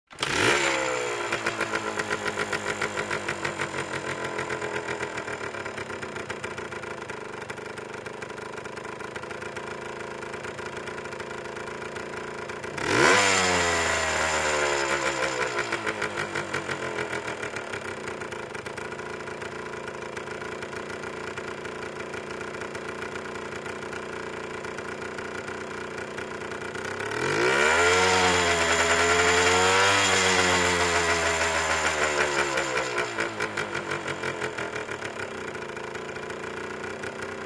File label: Motorcycle starts, regassing, jamming